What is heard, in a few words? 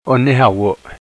drum